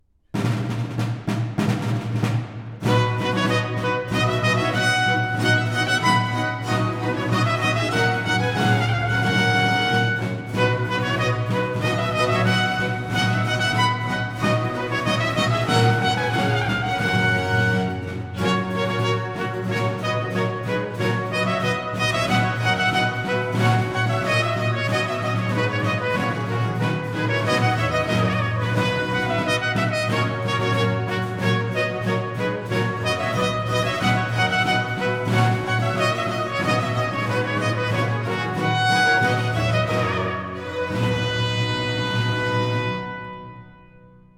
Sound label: trumpet tune; purcell henry